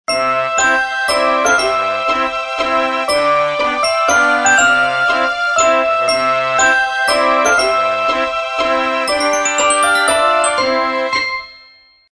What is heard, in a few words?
Spring Waltz; Sony Ericsson